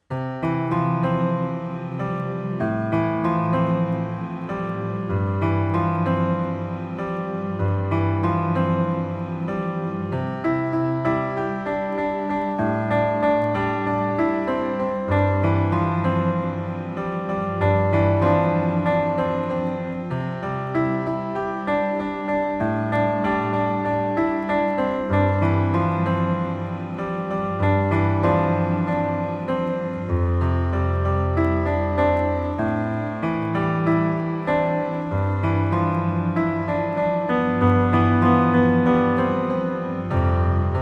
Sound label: easy piano